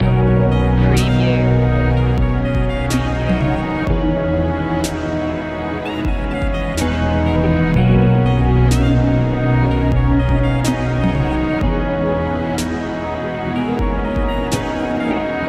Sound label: deep technology loop